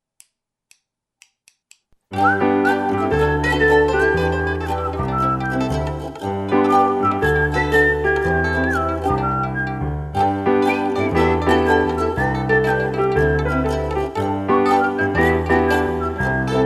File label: Piano Whistle